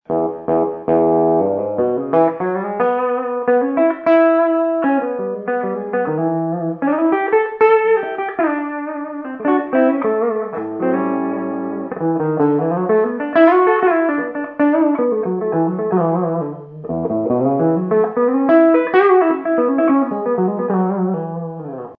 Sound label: bridge, guitar